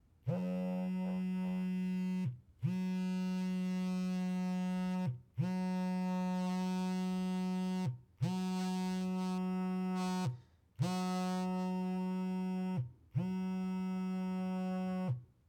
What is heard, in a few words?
Cellphone Vibration On A Wooden Box